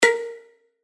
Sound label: Pluck Shot A#; synth shots tech house; Cliffhanger; revealed